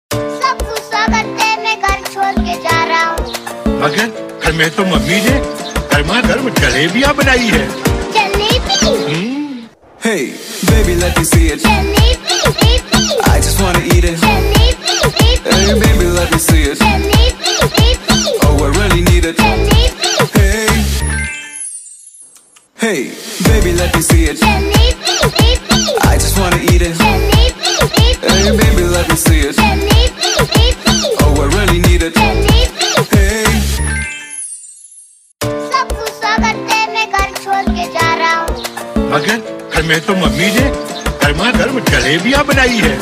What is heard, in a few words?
remix; kid 90s